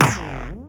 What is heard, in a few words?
perc